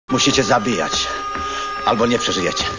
death; quake2

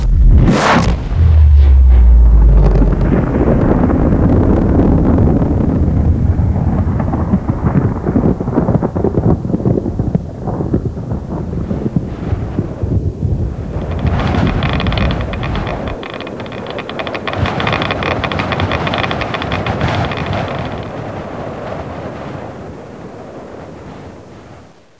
distant nuke; quake2; kitsune

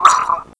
gurp; quake2; austavin

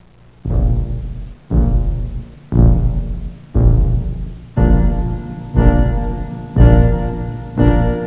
clockwork, tunes; quake2

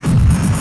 fall; quake2; eva-01

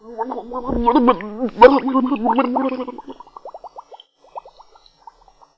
drown; quake2; chastitymarks

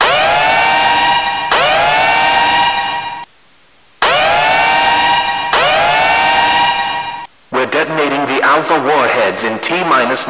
warhead warning; quake2; kitsune

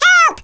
pain; quake2; lemming

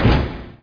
jump; quake2; gears

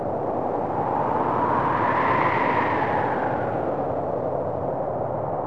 backwind; quake2; inland

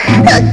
bump; quake2; hottie

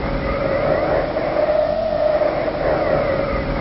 hum, world; quake2

